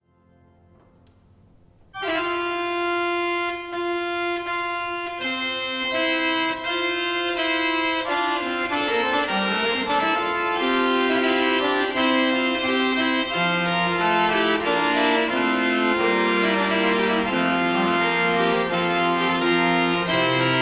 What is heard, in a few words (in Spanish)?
tiento sexto tono; laserna